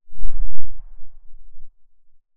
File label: silencer dvl10 very far